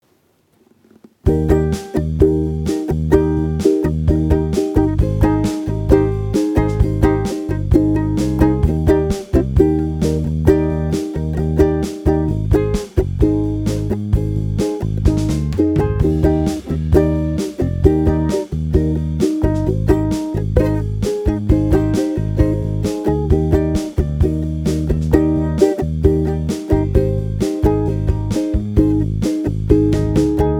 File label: Uke